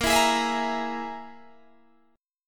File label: E Major Flat 5th Bb